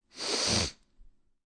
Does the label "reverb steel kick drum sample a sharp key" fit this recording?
No